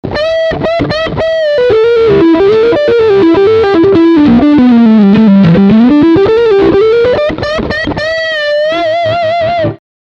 Lick Front Middle Pickup

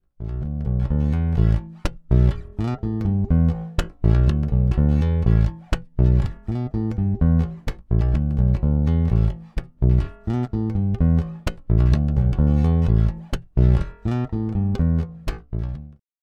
Fender Precision Bass Both Pickups passiv; Troy Sanders

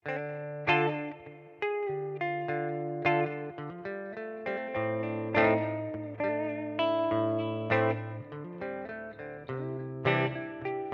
Plate Reverb EHX Oceans 12

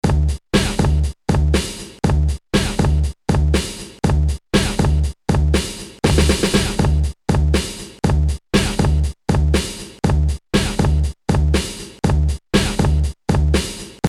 AKAI MPC60

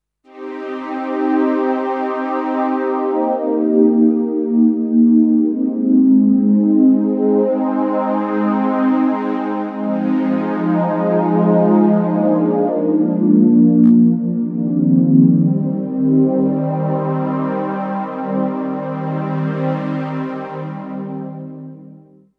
LP 2 Pol Cutoff 115 Hz 4 percent Reso

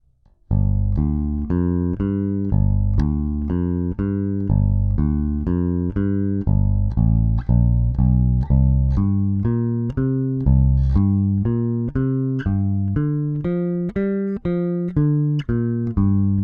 AER AMP One Two Three Treble boost

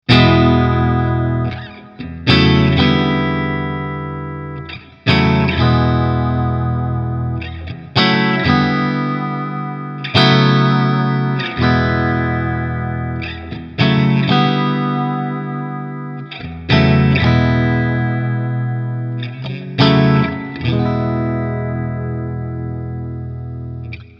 PLATE

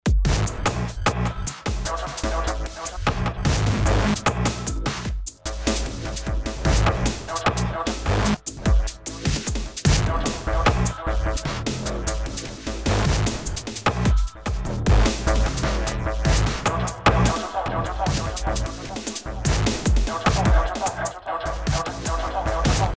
NI Battery 4 contrete cru kit

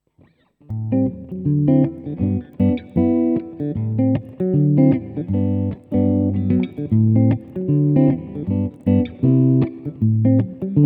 Jazzy rythm

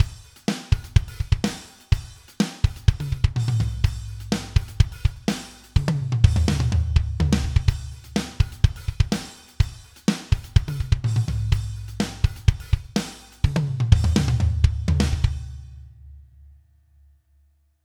Steven Slate Drums 5 Rock Kit Deluxe; Savage

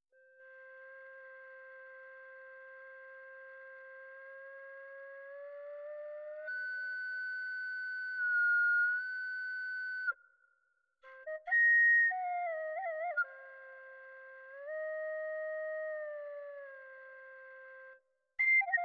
OSMOSE tin whistle; EXPRESSIVE E

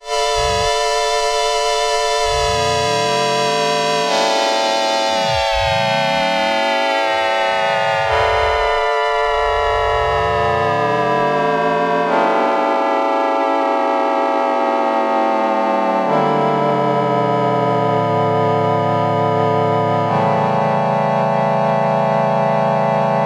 FMness; WAVES Element 2; Bio Hazard

